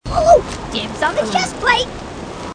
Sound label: grunt; h3dialogue; grunts